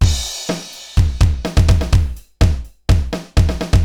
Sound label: Akai XE8 (125BPM), beats